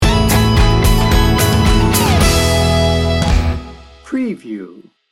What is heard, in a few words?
summer sunshine sting bumper, Hand Claps